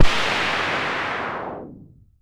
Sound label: Synare 3, Filtered Noise; noise hiss crackle